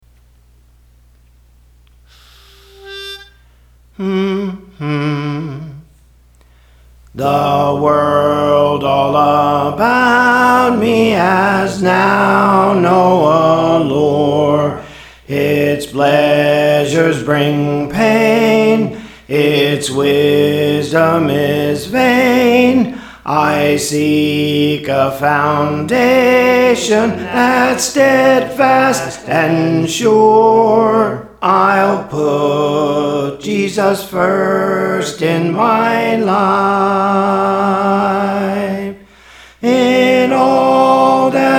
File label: Soprano; Praise For The Lord Training